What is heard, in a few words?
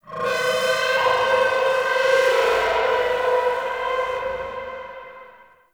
Modular Texture; Ambience; Warframe; Shadowgrapher